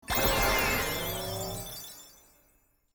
Clover Win; goldenClover

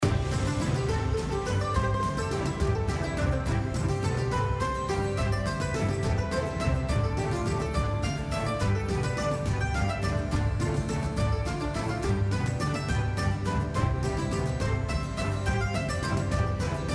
Background Bonus, slots; goldenClover

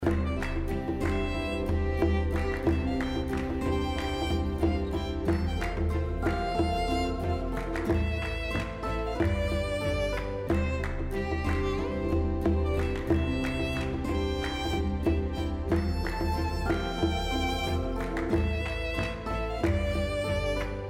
Background, slots; goldenClover

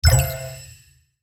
Pick Scatter In Reel; goldenClover

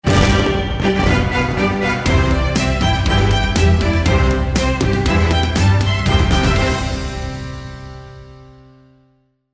Major Jackpot, slots; goldenClover

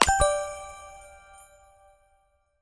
Click Right

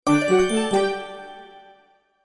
Gamble Win; goldenClover

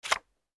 Gamble Card Flip; goldenClover

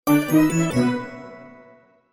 Gamble Lose; goldenClover

